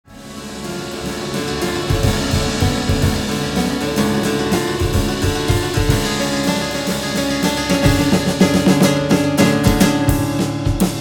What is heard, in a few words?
Fast Paced Guitars